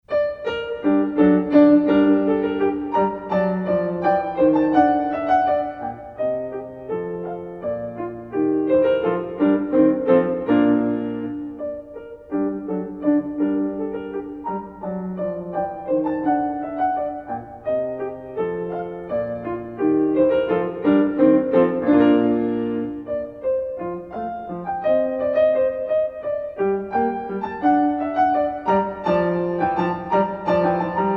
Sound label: Bourree In g minor; Marlais Book 5